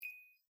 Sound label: checkbox click